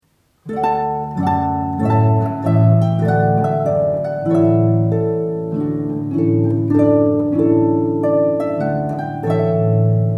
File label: Trumpet Air